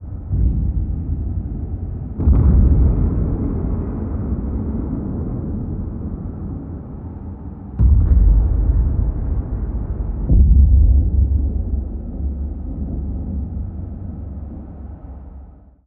deep explosions, underwater

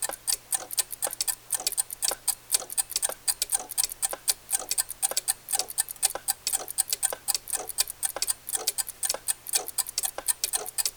clock shop amb; tock

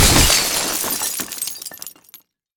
crash rocks glass

